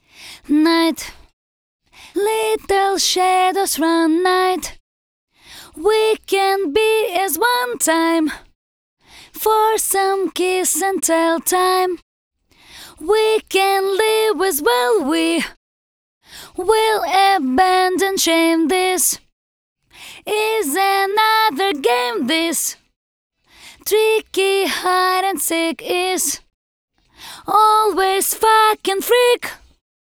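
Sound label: English voice, acapellas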